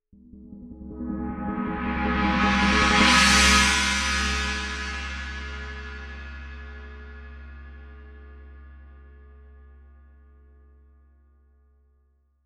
China Roll

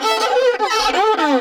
Violin Bit

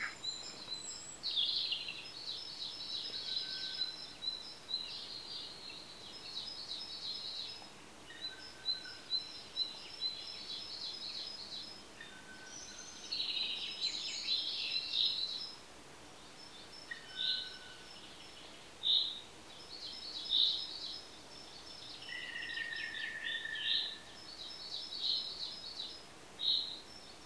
Forest Birds

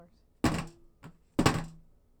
Metal Thunks

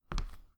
Hard Female Footstep

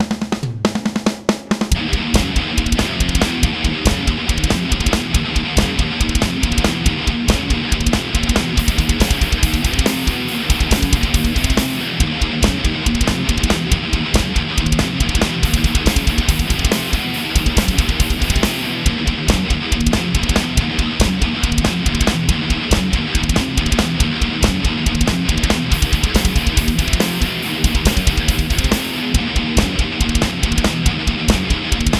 Riff

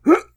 Heave

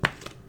Plastic Grab

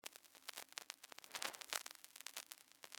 Vinyl Dust

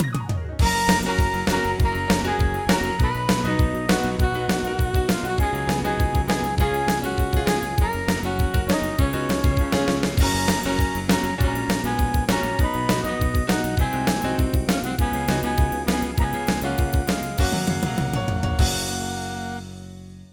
Title Screen

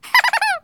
Cartoon Laughter

